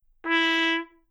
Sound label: trumpet note